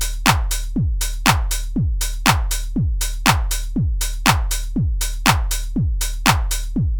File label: Basic Dance 120bpm